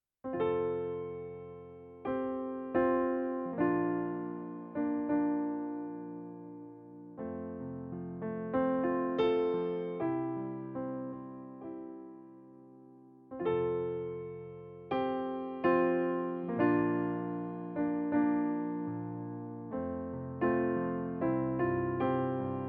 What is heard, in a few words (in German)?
Playback F-Dur